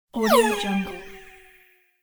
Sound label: martian whizz